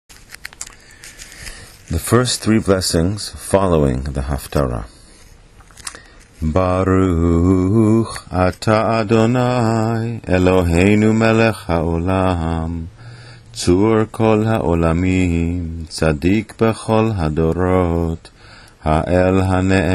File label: Blessings after Haftarah